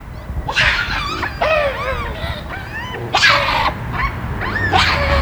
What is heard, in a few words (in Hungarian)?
orditas szelvedo, galleros pavian; állathangok; nyíregyházi zoo 2010